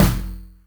verby tom sample g key, tom sample tuned to the G note. Loudest frequency: 2248Hz; tuned drums g key